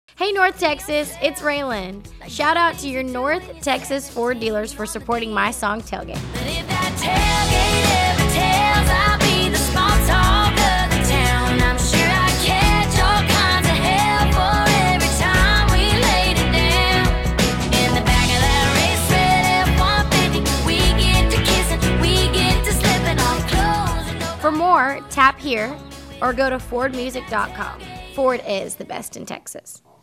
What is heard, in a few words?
Country; Ford NTX GM